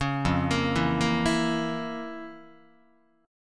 F Major 7th Suspended 4th Sharp 5th F, Guitar; FM7sus4#5 arpeggiated; JGuitar

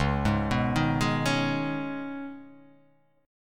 C sharp 7th Suspended 2nd

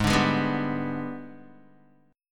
Ab Minor Major 7th Flat 5th, down, Guitar

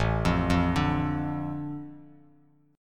A Suspended 4th Sharp 5th, Bass Standard; Asus4#5 arpeggiated; JGuitar

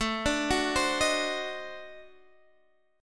D 7th Flat 9th A, chord

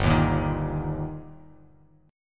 G Minor Sharp 5th G Bass Standard, chord; strummed; JGuitar

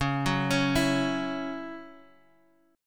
C sharp Minor Double Flat 5th, Guitar Standard; arpeggiated; 9,9,11,9,x,x

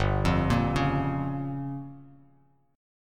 G Minor 7th Flat 5th, Bass Standard; Gm7b5 arpeggiated; JGuitar

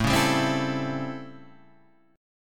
A 7th Sharp 9th A, Guitar Drop D; chord

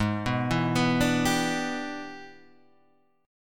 E 7th Sharp 9th G sharp

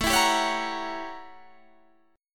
A 13th Flat 9th A, guitar chord

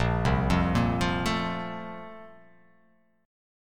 A sharp Major 7th Suspended 2nd, down

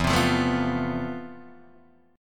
F Major Sharp 11th E

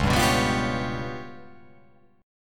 C sharp 7th Flat 5th, chord

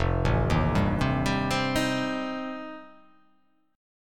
F 9th F, chord

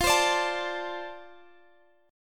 D-Minor 7th F, chord

